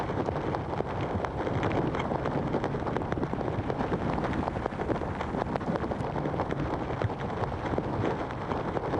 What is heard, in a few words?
EARTH FAST; SIMUZENSOUND